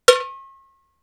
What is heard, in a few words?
cowbell studio one shot; percussion; single hits one shots